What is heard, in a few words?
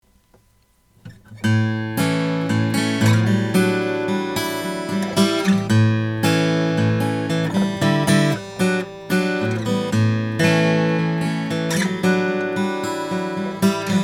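vines bella grande honduran rosewood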